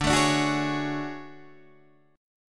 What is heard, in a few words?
D Major Sharp 11th D Guitar Standard, chord; strummed; capo 9